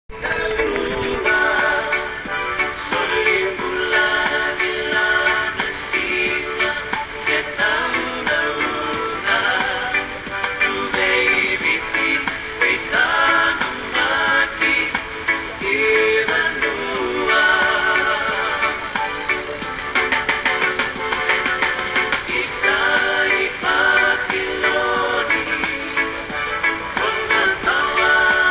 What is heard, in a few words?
fijian music